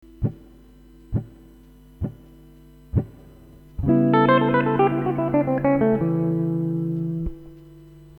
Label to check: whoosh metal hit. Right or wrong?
Wrong